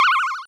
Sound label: Cry